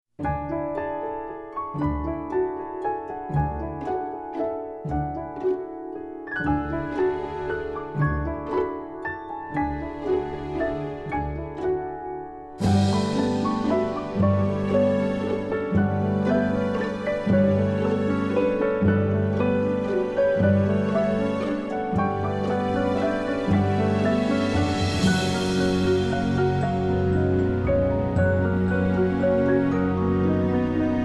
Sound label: Piano Instrumentals; Recepcion y Pasillos Hotusa